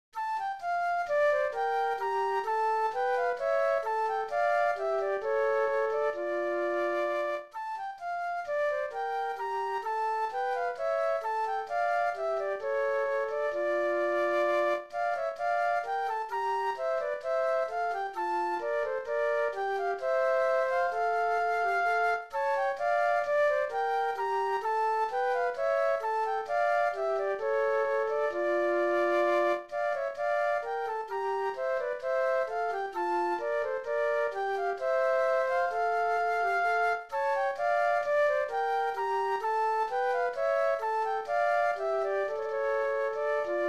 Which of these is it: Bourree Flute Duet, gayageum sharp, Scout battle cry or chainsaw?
Bourree Flute Duet